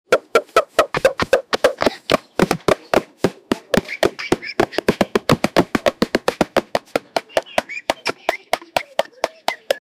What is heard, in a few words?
whipping sounds soldiers, boots